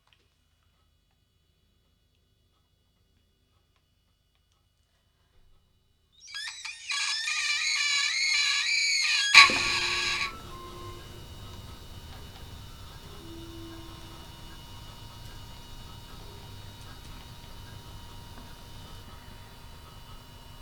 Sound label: tiger thrush